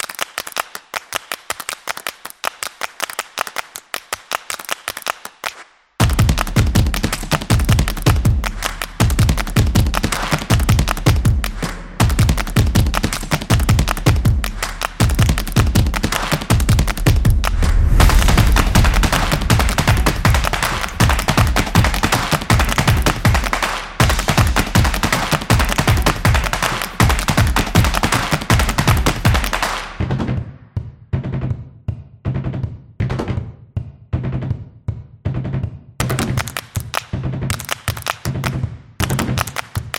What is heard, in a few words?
The Clap Trailer; soccer stadium